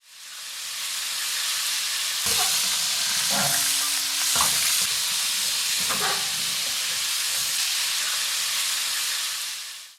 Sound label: oil; minigame